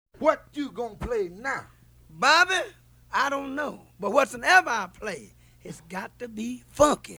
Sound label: funky